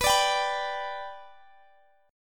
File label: B Minor Sharp 5th B Guitar Half Step Down, chord